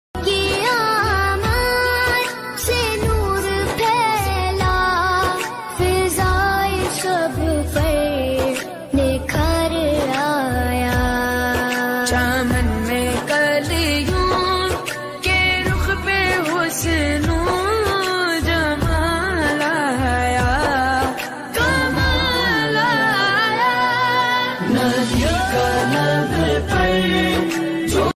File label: new beautiful islamic ringtone